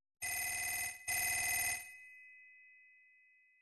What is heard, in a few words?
ring ring